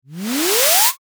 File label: whoosh reverse rise